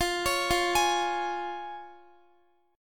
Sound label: F Minor Sharp 5th F down Guitar; arpeggiated; tuning 4E,4A,5D,5G,5C,6F